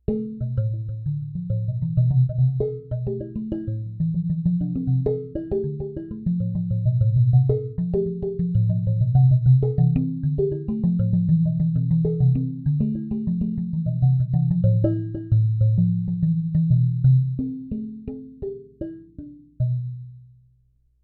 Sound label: Mallets Tapping